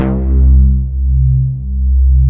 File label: POLYSOFTS, Synthsonic, DANCE; Pro-Rec